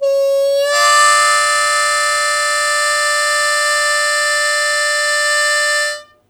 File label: Blues Chord Attack G1, Harmonica; Zero G - Harmonica